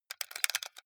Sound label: console controller xbox play short fast